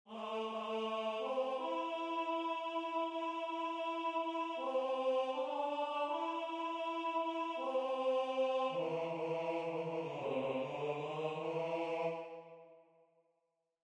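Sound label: Tenor, Religious Folk Songs Of the Negro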